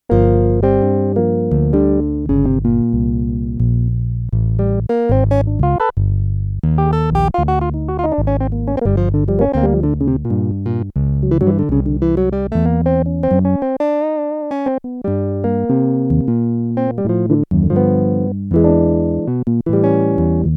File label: Korg DW-8000 JAZZ GUITAR II, Factory Patches